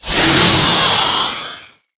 spider monster striking

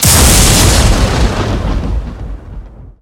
lightning strike

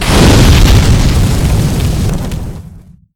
explosion medium

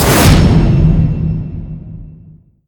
whoosh

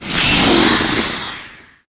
spidermonster ouch

